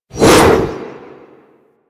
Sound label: Arch Wing Sword Whoosh; Warframe; Weapons